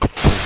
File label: kathunk; Paparazzi - Tales of Tinseltown; Activision